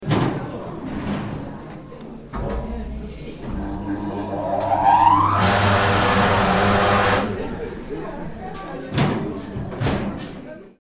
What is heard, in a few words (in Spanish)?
maquinas secadora de te, Aristóbulo del Valle, Misiones